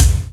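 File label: Bass Drum Gate, Acoustic Kick; Yamaha DTXpress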